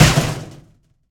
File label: Metal Sheets Sledgehammer Hit; Sound Spark LLC; Metal Hits, Scrapes and Squeaks